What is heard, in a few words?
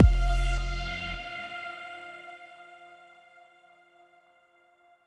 Follow the Vibe - Pad Fadeout; BB 2HTC Samples Vol 4; Pads